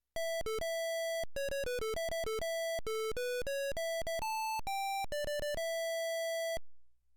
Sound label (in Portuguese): TonePlayer principal, arduino; tocando musicas no arduino com dois tones ao mesmo tempo